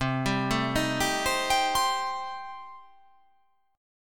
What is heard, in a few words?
C Minor 6th C